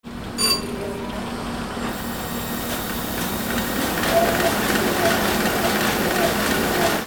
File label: INDUSTRY